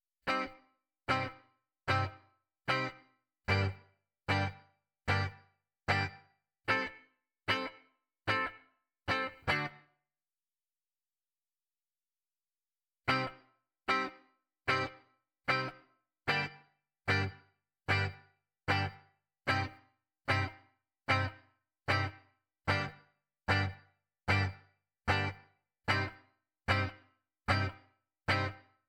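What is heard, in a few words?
Ragtime guitar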